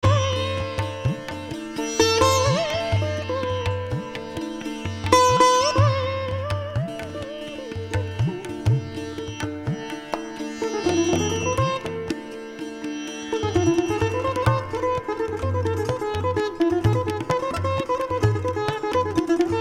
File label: DADRA Dhun